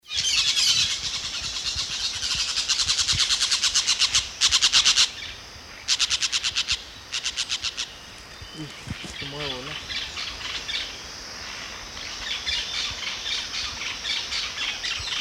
Brotogeris jugularis jugularis

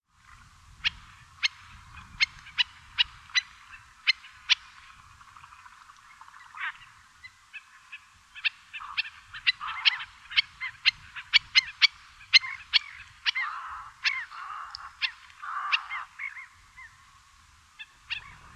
Himantopus mexicanus Cigüeñuela de Cuello Negro

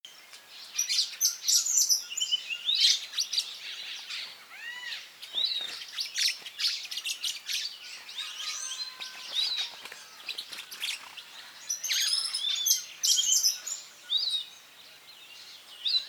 Machetornis rixosa Picabuey

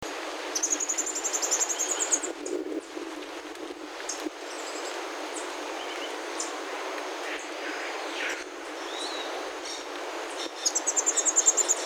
Hemithraupis guira Tangara Güirá

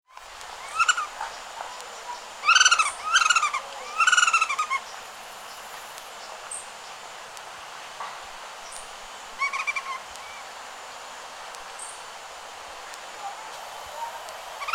Myiarchus apicalis, Copetón Apical

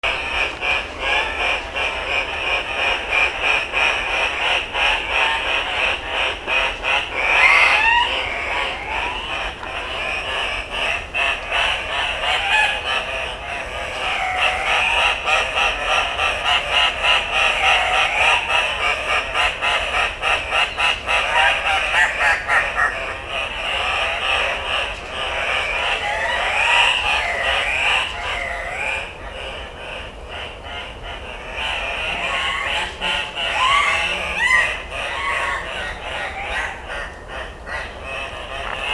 Rupicola peruvianus Gallito de las Rocas Peruano

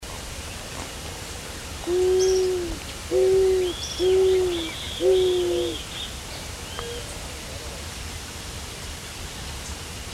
Zenaida auriculata pentheria Zenaida torcaza

